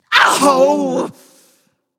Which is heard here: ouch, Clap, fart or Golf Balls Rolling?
ouch